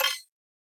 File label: Hub Comp Beep; Ambience; Warframe; Tenno Hub